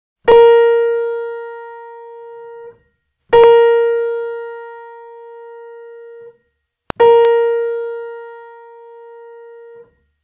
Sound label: Tuning Notes